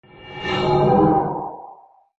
whoosh

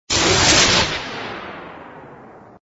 menu click; avengers; city Under Attack

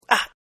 male hit; phineas and ferb; snowbrawl

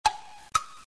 clock tick

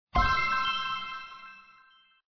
match; fairies

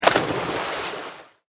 splash Landing; kickbuttowski; mellowbrook

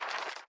storybook page turn; princess

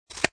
instance delete; toystory; toystorymania

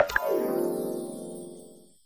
clothing done; princess

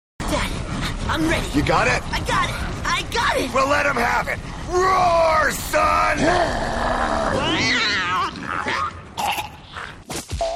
laughter; the wild

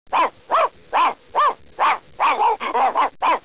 barks; goof troop

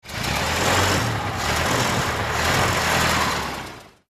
Revs, cars; disney; Lizzie

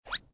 slide up hi; snow white

